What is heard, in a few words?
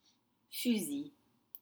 fusil gun